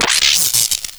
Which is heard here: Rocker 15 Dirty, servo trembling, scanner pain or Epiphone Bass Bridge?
scanner pain